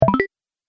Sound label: Game Pick It Up, video game; Twistedtracks